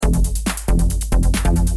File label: emote ride the pony music; fortnite emotes; athena